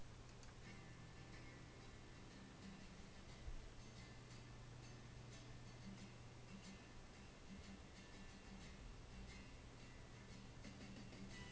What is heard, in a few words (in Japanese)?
iPhone